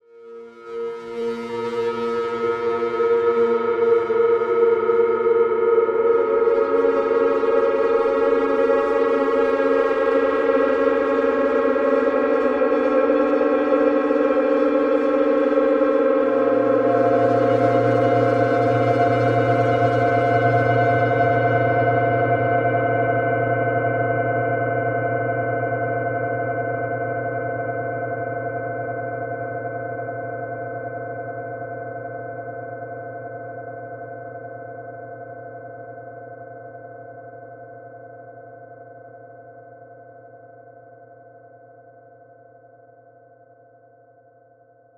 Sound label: Drones Horror